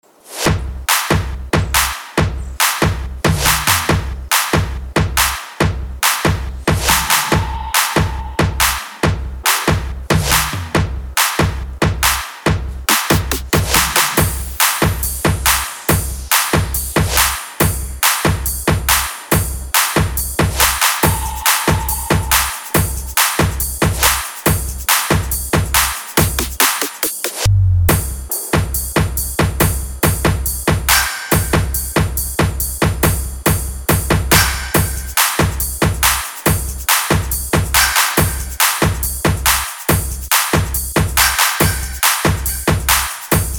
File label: Upbeat Drums